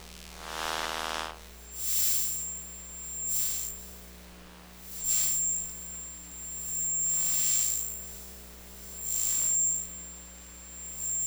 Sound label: Static Passbys; SoundMorph; Lost Transmissions